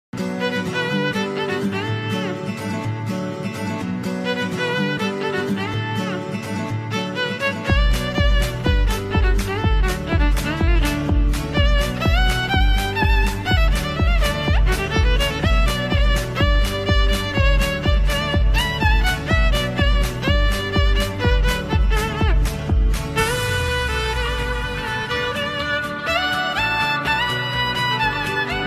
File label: violin